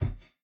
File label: reel Stop; robin hood